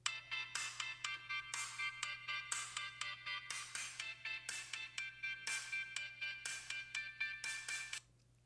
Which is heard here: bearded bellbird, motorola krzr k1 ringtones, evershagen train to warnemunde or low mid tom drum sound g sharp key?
motorola krzr k1 ringtones